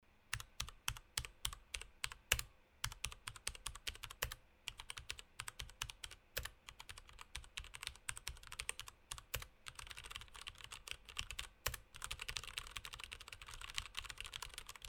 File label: Mid, keyboard; hyperx alloy elite keyboard; review hyperx alloy elite keyboard